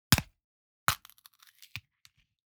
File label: Break Snap, Gore; SoundBits; JustGore